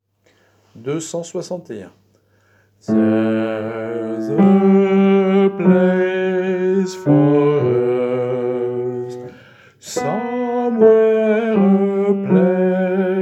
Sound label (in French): basse